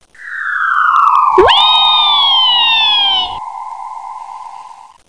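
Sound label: pteranodon fly, dino; My Animated Word Book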